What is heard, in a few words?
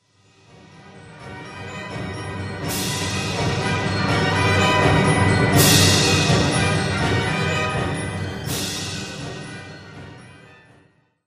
Music Tense Orchest